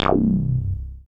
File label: SWEEP BASS; Masterbits; Dance Trax